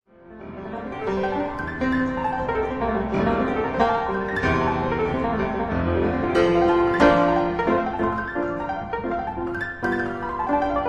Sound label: Etudes for piano in F major